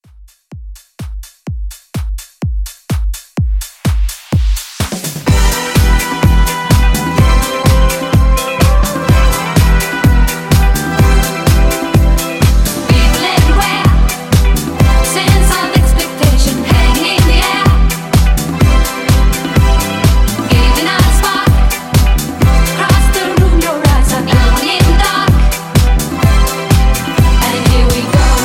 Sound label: 70s Disco Pop 126